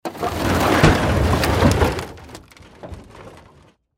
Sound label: Crash Debris Metal Slide Junk; Sound Effects:Materials; Blastwave